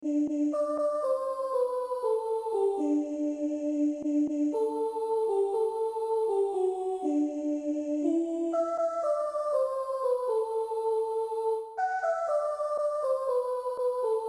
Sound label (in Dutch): sopraan midi